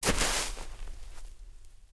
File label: player snow; Group Mapping Project